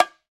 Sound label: E BONGO R, World Percussion